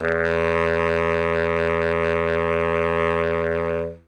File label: notes e, sax; Dirt-Samples